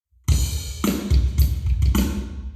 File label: Hall Drums 90deg; eBreve